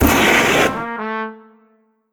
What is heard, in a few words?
trombone; rainblower; tf2